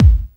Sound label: KIT, Dance Mega Drums; Best Service